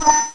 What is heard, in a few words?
Time Ting; Let's Discover New York